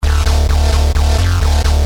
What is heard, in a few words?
Driven, Bass; Industrial